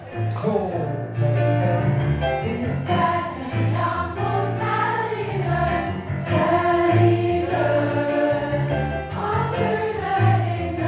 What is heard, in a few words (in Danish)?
et barn er fodt i bethlehem alt